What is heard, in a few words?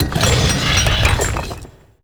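stone wall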